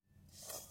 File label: dry creek bed